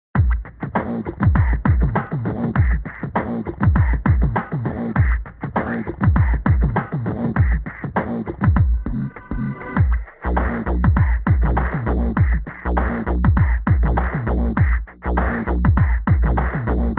car music; cstrike; cs xemmas

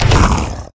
fall pain

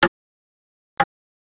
ticktock

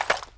sg552 grip; svencoop

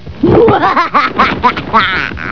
laugh

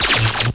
build

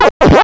chomp; tfc; pac

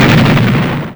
wind

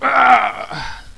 alpha die; svencoop; paranoia

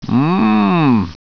mmmm; svencoop

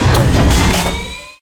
complete once; svencoop; ragemap3

